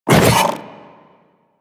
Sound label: Companion Melee Swipe; Warframe; Pets; Zanuka; Foley